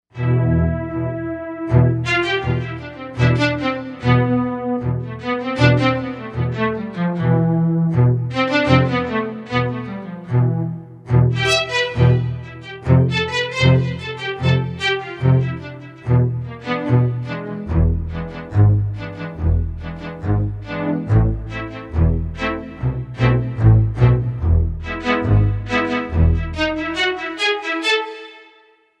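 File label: Arco String, Korg M1Rex; Factory Patches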